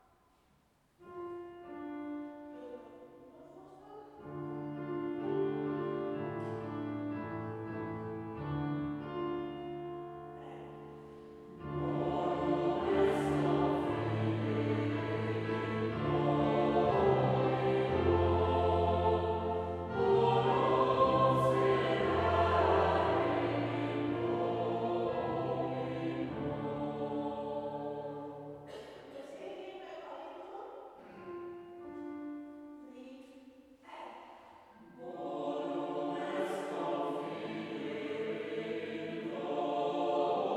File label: Taize